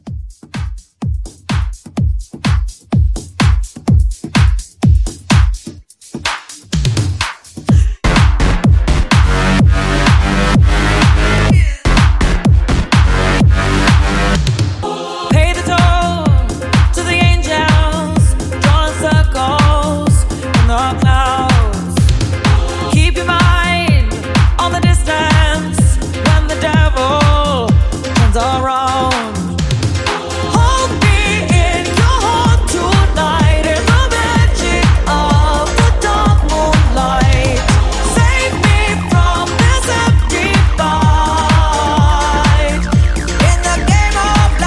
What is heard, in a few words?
Club Mixshow 126